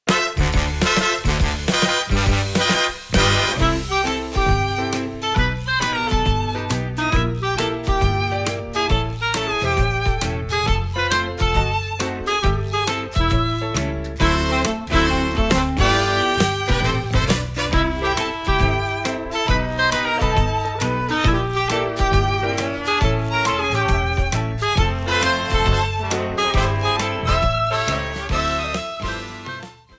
Ending Arrangement; SMW

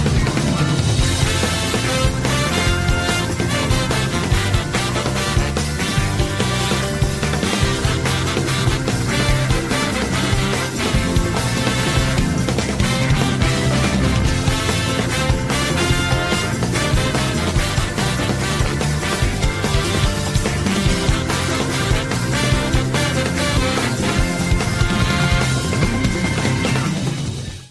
Underground BGM Arrangement; SMB2

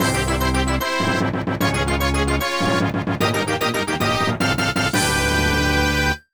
Final Bowser Defeated; NSMB

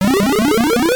Mega Mushroom Sprout; NSMB

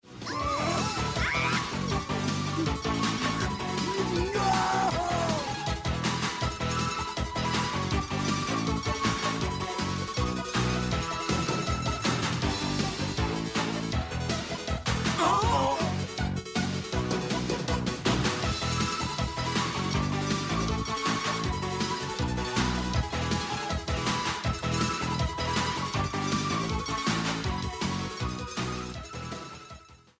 Speedy Gondola Instr

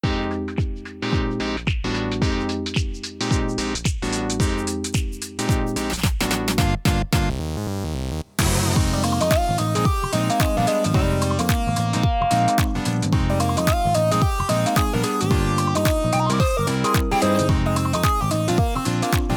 Electro Arrangement; Toad Harbor

